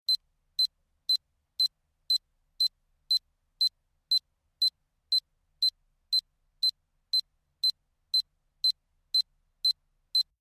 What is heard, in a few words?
Nikon FG Sonic Warnin, singles beeps